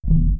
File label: Windows Startup & Shutdown; Windows Never Released Soundpack 2